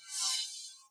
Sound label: holo delete; federation